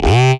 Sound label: SYN Skwakka